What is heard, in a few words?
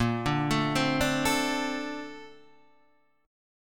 Bb Major 9th, Bb, down, Guitar, DADGAD